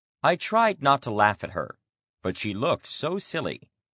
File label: laugh; I tried not to laugh at her, but she looked so silly.